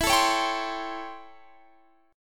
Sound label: A 7th Sharp 9th